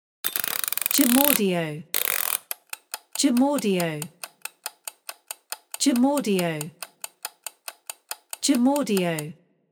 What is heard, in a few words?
Timer Ticks Spring Mechanism